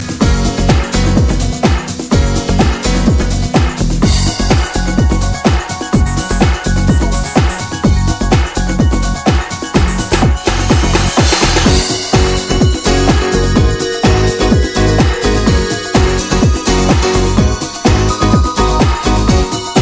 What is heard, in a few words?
horn; nights into dreams